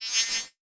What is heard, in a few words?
Digital; Adobe; Multimedia Internet CD-Rom Flash